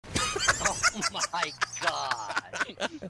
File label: laff